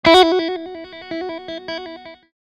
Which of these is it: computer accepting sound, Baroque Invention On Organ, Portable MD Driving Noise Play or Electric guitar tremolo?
Electric guitar tremolo